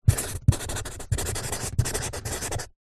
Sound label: office marker write on empty cardboard box